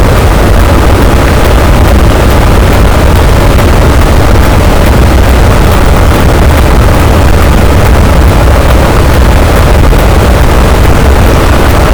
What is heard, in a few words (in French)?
cachalot, boat; Physeter Macrocephalus